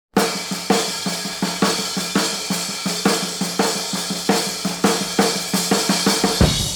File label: rock and roll intro, drums pattern